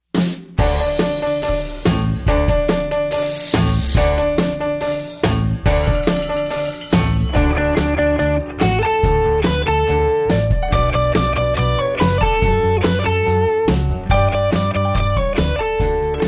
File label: Whirl Welcome Song (Instrumental)